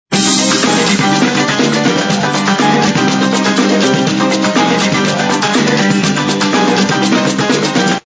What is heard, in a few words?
Latin Loops; Motorola V220; Alert